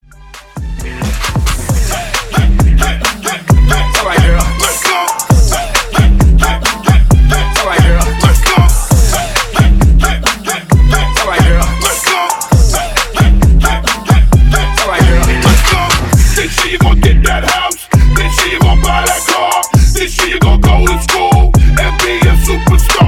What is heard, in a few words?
Hype In Outro 133 BPM